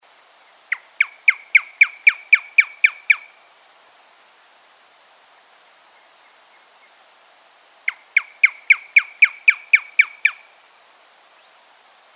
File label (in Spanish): gallito arena, Teledromas fuscus, Sandy Gallito